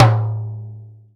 TIMBALE LO; Sonic Reality; Interactive Drum Kits

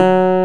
BASSES, Roland

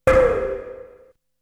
Perc; ZERO G - Datafile DISC 2

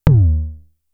Tom, Toms; Fricke Schlagzwerg; Drum Machines

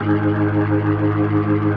G#1F, B3, Vintage Keyboards

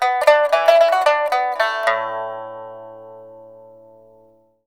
PIPA PHRASES; Spectrasonics; Heart of Asia

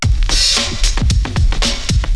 breakbeat; jazz jackrabbit 2

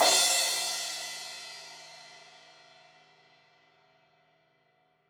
Crash 16in edge, Cymbals; Deeply Sampled Drums

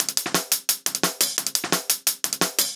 DNB BREAK; m8 breaks

